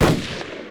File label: shotgun